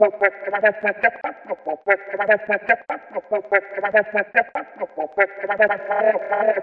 145 vocal tweaky wah loop; VOCAL OR VOXY LOOPS

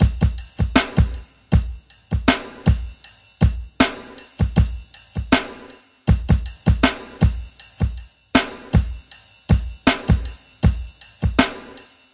uncertain groove, drum loops and breaks, 079 bpm; 000 to 080 bpm

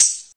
tambourine simple; Western and Latin Percussion; single hits

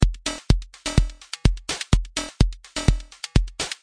robot walk, drum loops and breaks

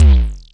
bird kick

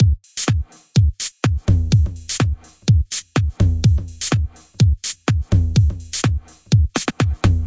125 bpm retardo housey toms

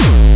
obnoxious kick

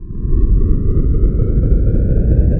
Electro FX; Ueb HE Electro FX

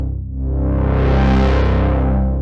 synth swell loop

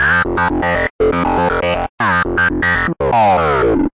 120 funky vox synth rhythm; VOCAL OR VOXY LOOPS

